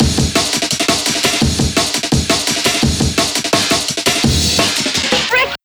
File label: 170 Freak Break, Loops; m8 breaks